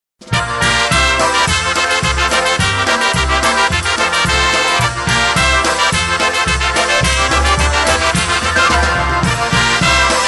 Polka

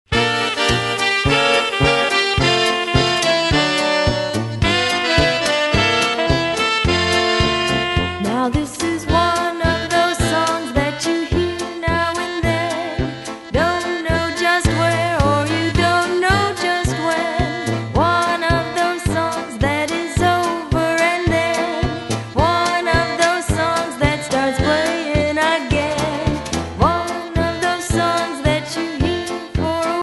Polka

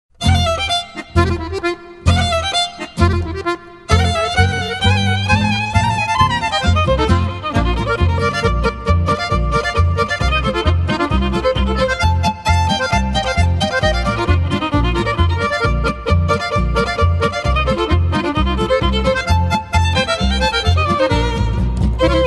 Clarinet Polka

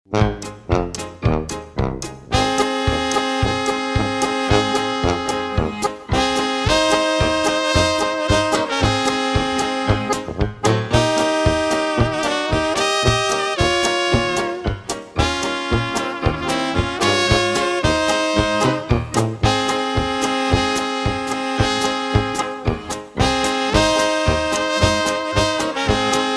Polka

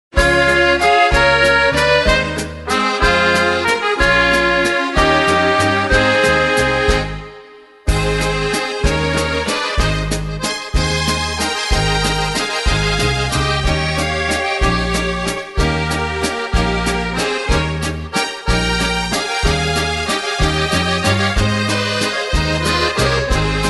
Waltz

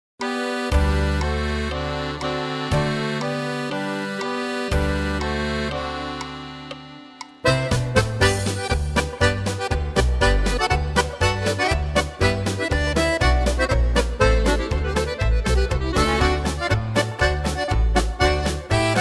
Polka

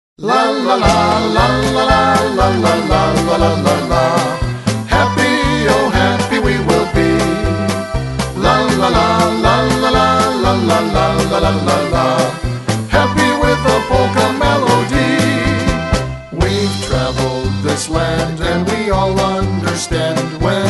Polka

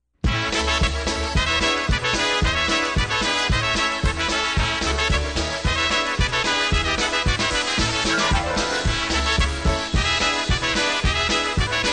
Polka

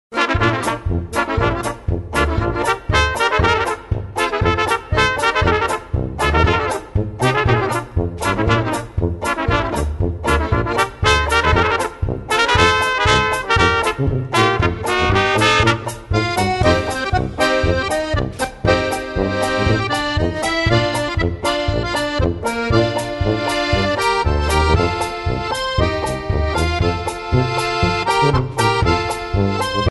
Polka

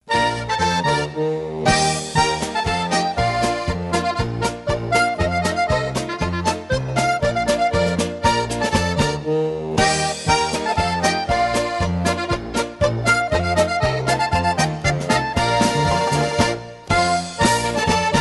Polka, Button Box

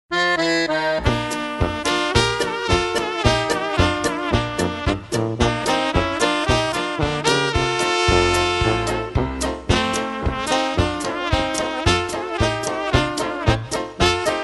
Polka

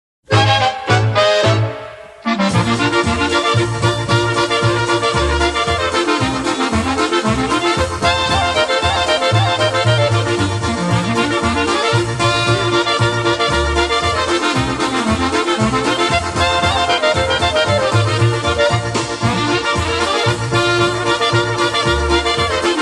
Clarinet Polka

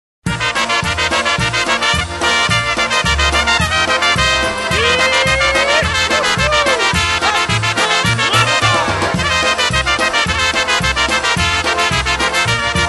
Polka

